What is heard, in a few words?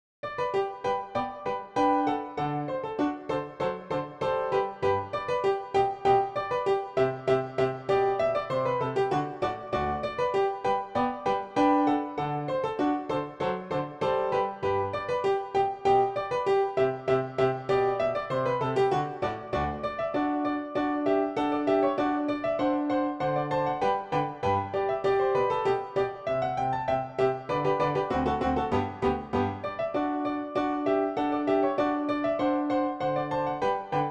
Dance PianoTeq Bechstein